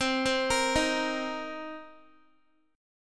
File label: C Minor 7th C Guitar; Cm7 arpeggiated; x,15,12,15,15,x